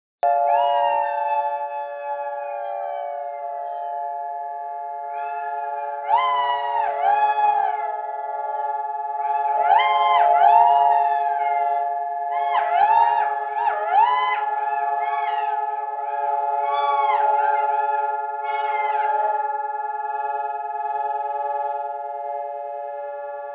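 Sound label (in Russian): Voy shakalov i siren, jackals howling and sirens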